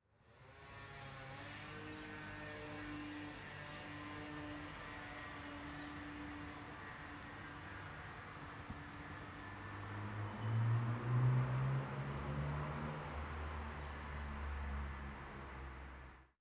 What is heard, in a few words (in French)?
Mobylette Voiture Clochette Au Loin Oiseau; Petite Ceinture